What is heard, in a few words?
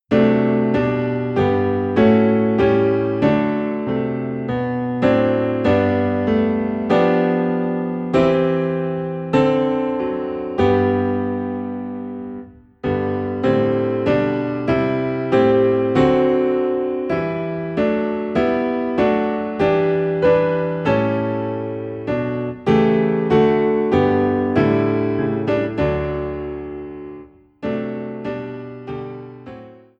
Piano Low in G, hymns; May Jesus Christ Be Praised